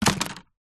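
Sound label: leisure fishing rod set down wood jetty; zapsplat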